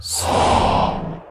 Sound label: Demon Portal Summon